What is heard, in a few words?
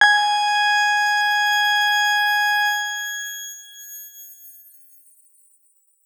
X Grain G#5 mf; healing soundscapes; Ableton